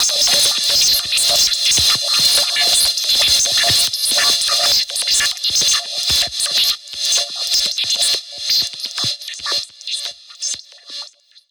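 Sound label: Modulation Processed, alarm siren samples 125bpm; musicradar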